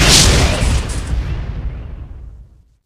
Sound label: medic charged death; video games; source engine; tf